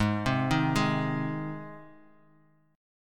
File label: Ab Major Ab down; arpeggiated; JGuitar